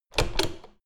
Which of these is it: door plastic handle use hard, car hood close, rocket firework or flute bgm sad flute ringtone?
door plastic handle use hard